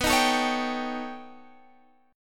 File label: B Augmented 9th B Guitar Drop D